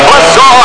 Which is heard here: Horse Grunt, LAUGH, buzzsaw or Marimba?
buzzsaw